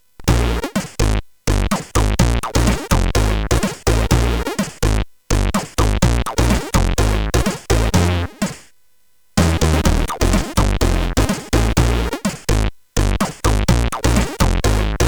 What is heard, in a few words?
Disco, sid CSG8580R5